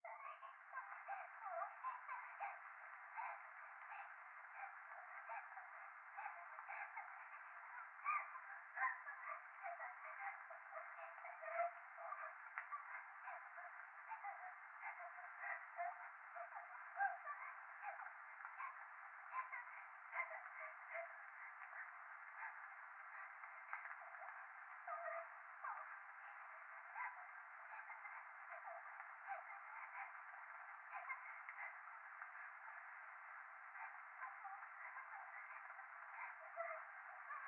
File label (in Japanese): 風呂 可愛い喘ぎ 雨, ASMR